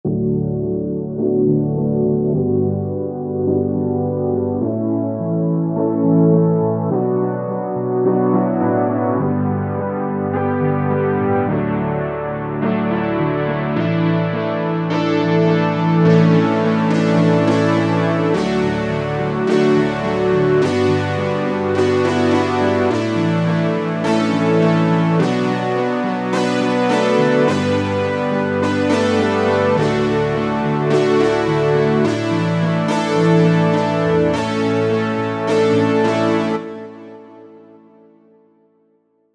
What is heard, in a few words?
OB Stab Brs; vintage synth roland srx keyboards